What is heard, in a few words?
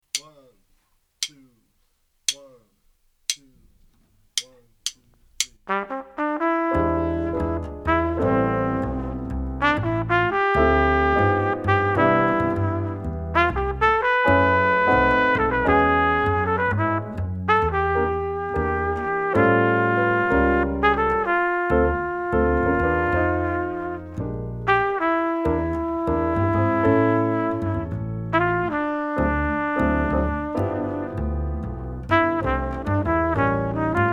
minus drums; count off sets up the melody; comp for the melody; comp for the trumpet solo 2 choruses